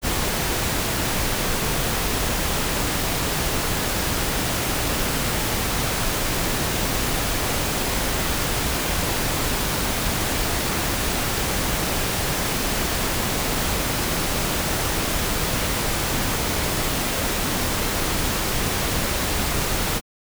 Uncorrelated Pink Noise

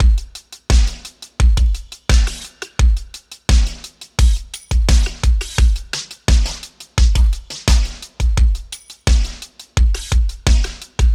Full Drums, Synthwave; Flamingo; Synthwave Loops; Futurism

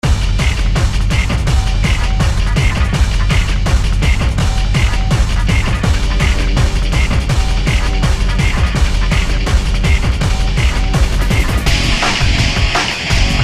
Music background pads

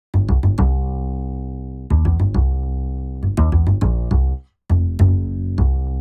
Walking Upright Bass

